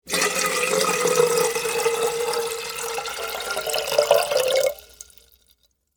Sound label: Water Pour Teapot, Into, Metal; Pouring (Sound Effects:Objects); BlastWave